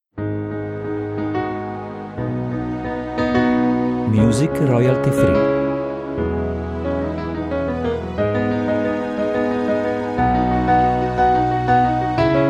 Cinematic

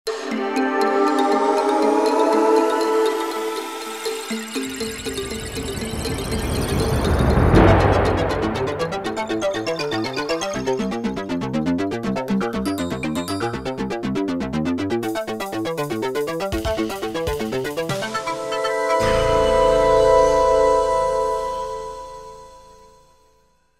News Radio Tv

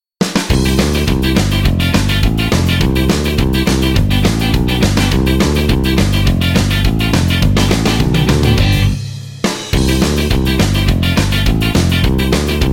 ska

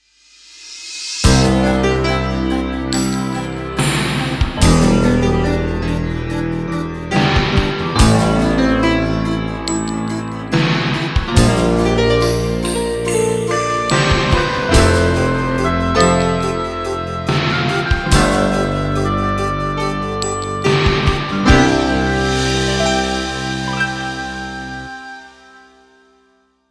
soft

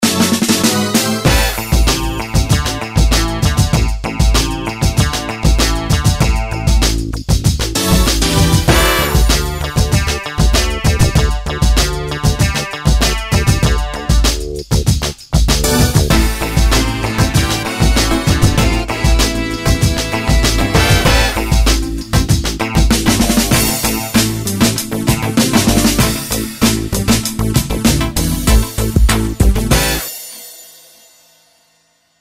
Action Crime Film Music

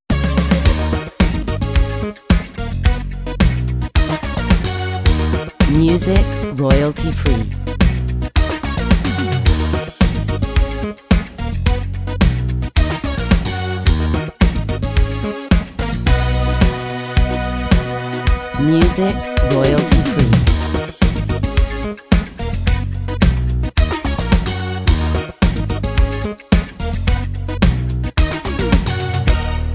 Upbeat pop

